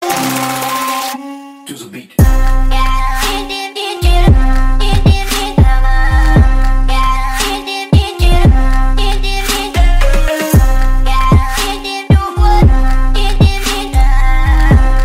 Astral Remix, ringtones

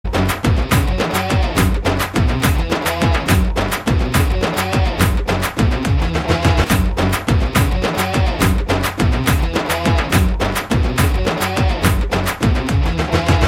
dhol beats; ringtones; games; ipl5

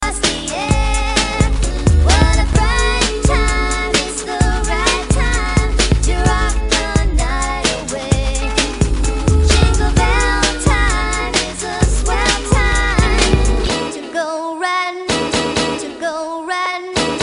BELL HIPHOP MIX

